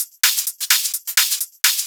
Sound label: Hihat Loop 128 BPM